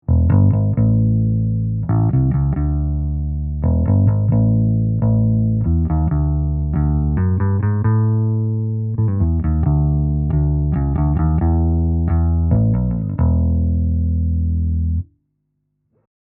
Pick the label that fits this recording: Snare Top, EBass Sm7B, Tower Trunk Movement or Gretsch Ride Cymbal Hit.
EBass Sm7B